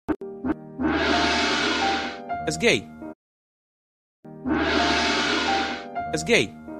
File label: gong gong gong